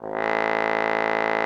trombone A1; Xsample VOL-3

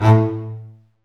STR, Cb Bowed, Cb Marcato; Roland L-CD702

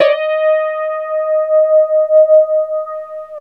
tuners

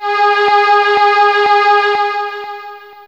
Str Mid C5, LoString; Fairlight CMI IIe

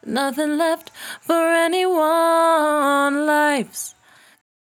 68bpm D, Velvet Vocal; Zero-G

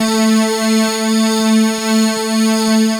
Dream Pad (single note)

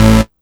Synth Hit A; Zero-G Trance Inducer; 90 s Sample CDs

